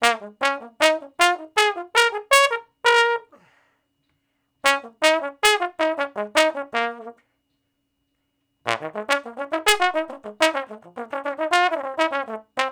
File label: Trombone Shuffle (Bb); Crimson Blue and Fabulous Horncraft 4 RnB; Horncraft for R&B; Solos